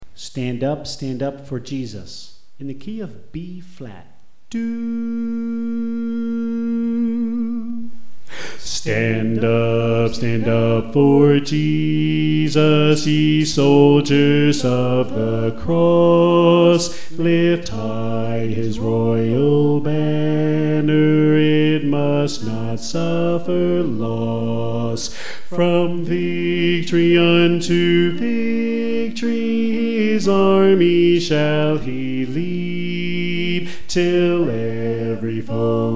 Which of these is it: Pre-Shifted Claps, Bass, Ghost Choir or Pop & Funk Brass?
Bass